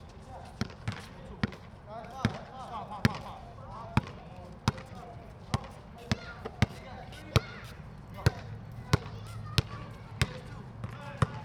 Downtown Houston Root Memorial Square Basketball Game